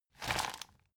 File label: plastic bag hit medium